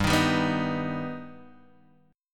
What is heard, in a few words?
G Augmented G Guitar Half Step Down